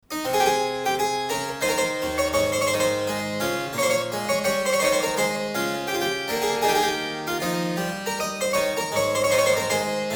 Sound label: Courante; Graupner